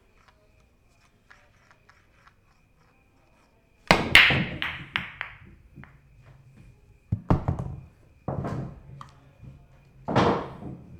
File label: Break And Sinker